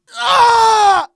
mega pain; hl2dm

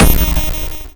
fail; arcade

